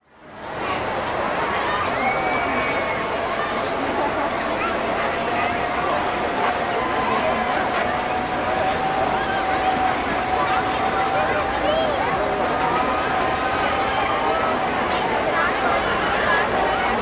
Fair Busy Amusement Park, Walla; Sound Ideas; Walla Series

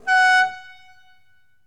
Ferrari Testarosa Horn; Automobiles

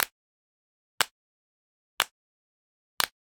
Generic Button Wood Tiny

